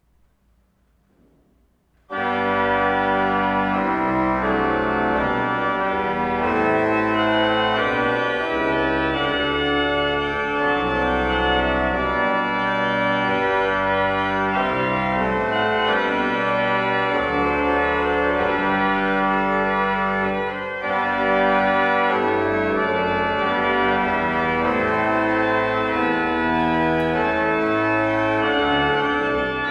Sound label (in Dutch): geluid